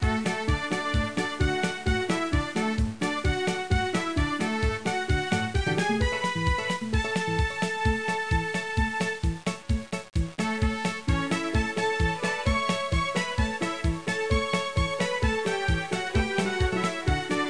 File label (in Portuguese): trilha; GEOGRAFIA